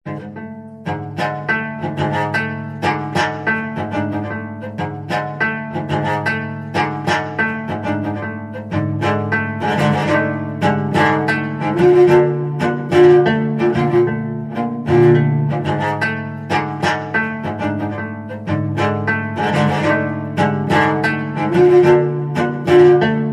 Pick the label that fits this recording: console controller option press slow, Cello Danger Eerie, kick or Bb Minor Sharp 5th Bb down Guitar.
Cello Danger Eerie